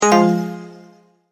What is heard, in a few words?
multimedia tone error